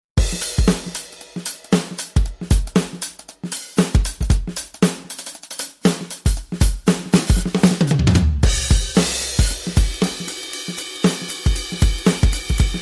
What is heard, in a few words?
Vintage Drums, V-Drums; V-Drums 音色扩展包; V71，V51，V31 音源高品质多重采样音色; DBMIX Vol. 01; Roland